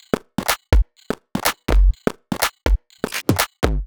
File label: Tribal Top Loop - 124 BPM; House; Top Drum Loops; Cymatics